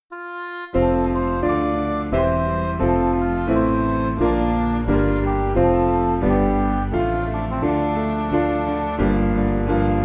We Place Upon, Midi; Distress

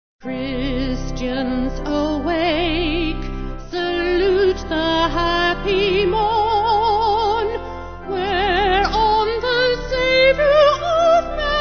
Vocal Organ

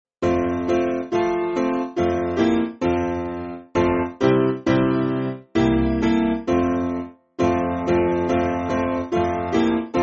Piano, church music; Jesus Christ Is Made

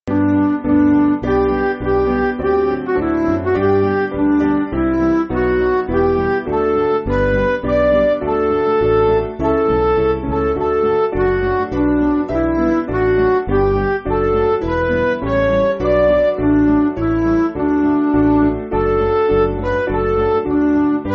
Midi

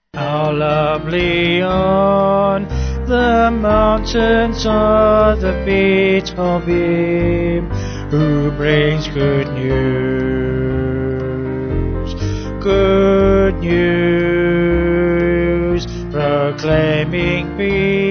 Vocal Band

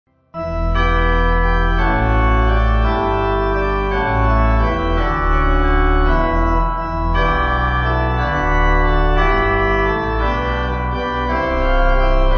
bells, pipe

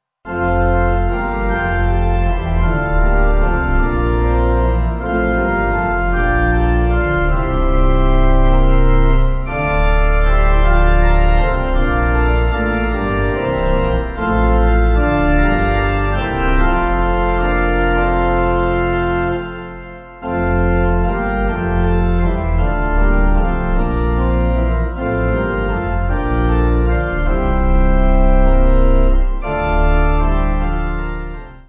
Pipe Organ, Music Backing Files for Churches

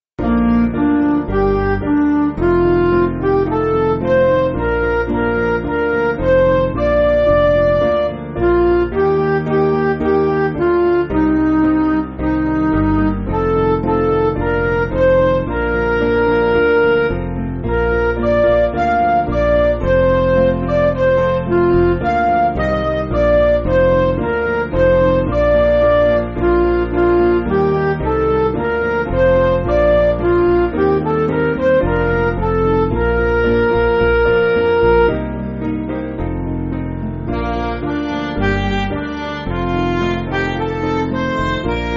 Midi

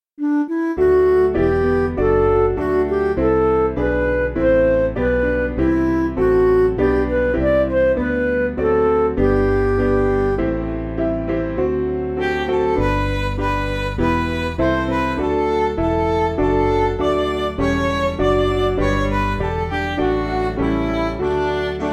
Midi; Awake My Soul; Morning Hymn

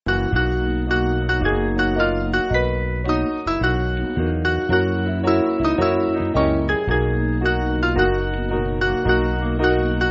Piano

